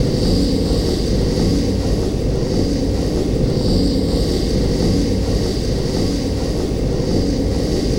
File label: Drone Ambient; Drums & Drones